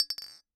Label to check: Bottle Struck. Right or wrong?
Right